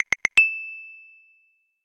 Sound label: crosswalk, notifications; Google; Google Sounds; Play It Loud